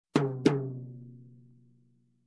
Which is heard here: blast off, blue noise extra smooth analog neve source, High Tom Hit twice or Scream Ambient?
High Tom Hit twice